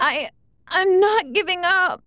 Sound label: death; videl